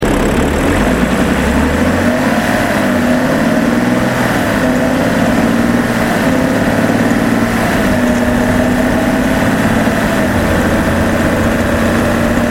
528i Normal Acceleration Outside

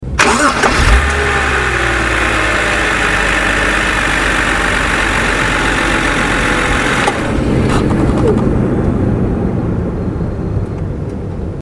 BMW 525i Ignition Outside

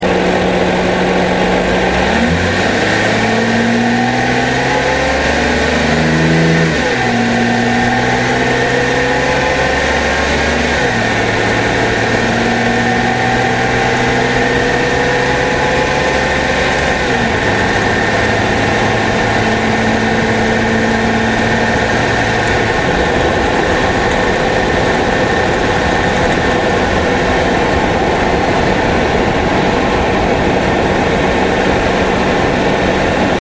325i Sports Normal Acceleration Outside

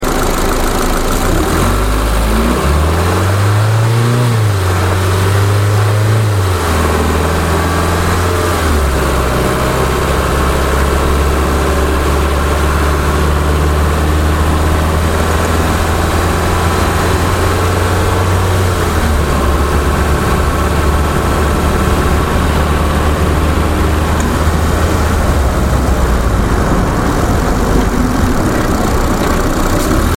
Ford Fiesta Sport Normal Acceleration Outside

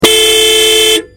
Ford Fiesta Horn Outside

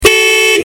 Ford Focus Ghia TDCi Horn Outside

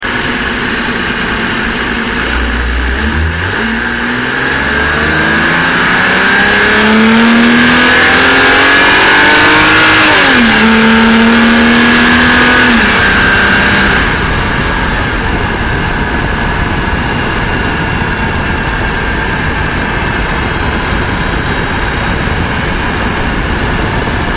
325i Conv Acceleration Outside

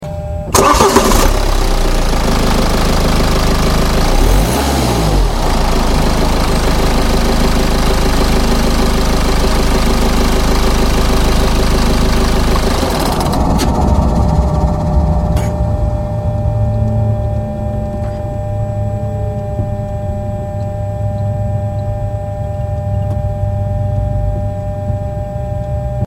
320d Sports Ignition Outside

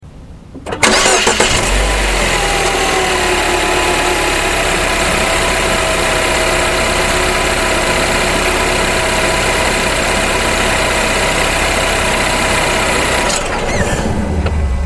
Cooper S Ignition Outside

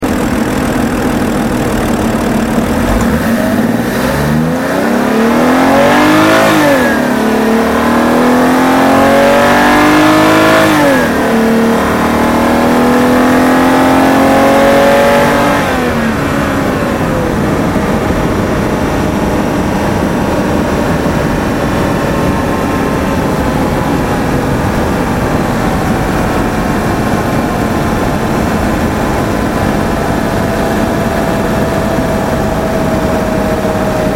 730Li Hard Acceleration Outside

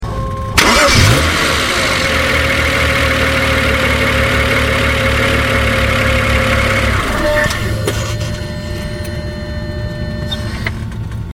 135i Coupe Ignition Outside

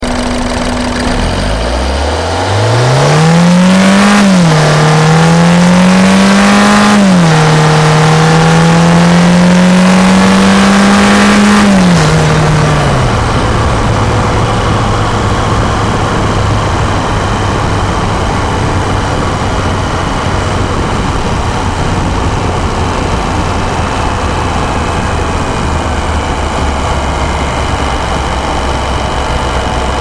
Mini Coupe Acceleration

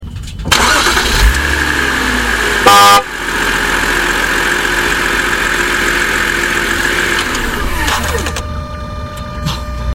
325i Conv Ignition Horn Outside

